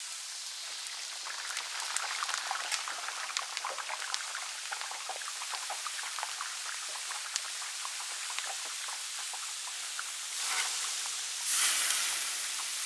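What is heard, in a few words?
Ambience Diner Frying Loop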